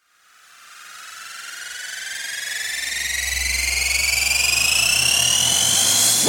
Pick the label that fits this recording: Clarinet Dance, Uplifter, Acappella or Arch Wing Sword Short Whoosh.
Uplifter